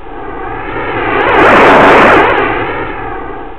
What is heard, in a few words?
Hoverjet